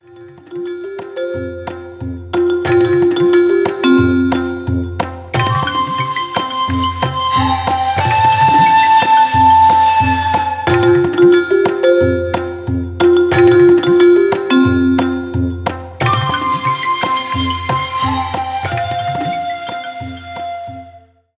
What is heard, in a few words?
iphone eastern ringtone